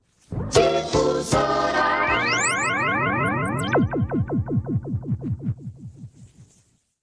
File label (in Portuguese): Vinheta, radio; Radio Difusora SP